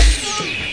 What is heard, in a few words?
Electro FX; Ueb HE Electro FX